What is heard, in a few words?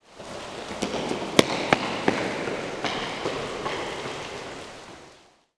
steps in hall